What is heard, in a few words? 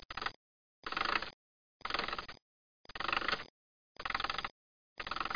wnd clck